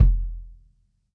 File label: Kick, drum